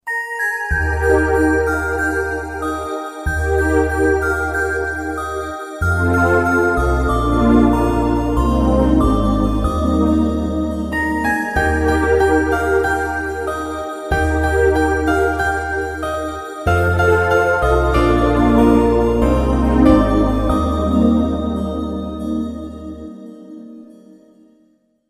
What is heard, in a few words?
Christmas music ringtone